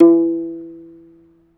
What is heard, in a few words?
AFRO HARP E4; AFR. HARP2; Heart of Africa